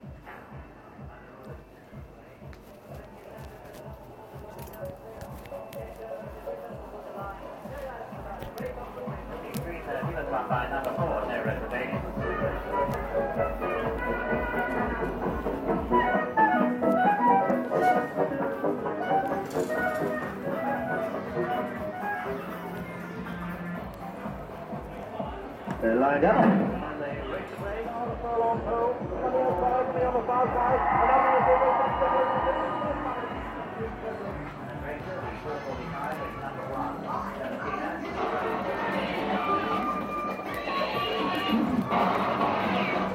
burntisland amusement arcade soundscape binaural recording